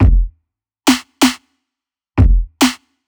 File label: Durrty Step 138 bpm, drums; Loops; Ableton Live 12 Lite; Core Library